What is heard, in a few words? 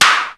SIMM WAK, Elec. Percussion; E-MU Producer Series Vol. 2; More Studio Essentials; Composer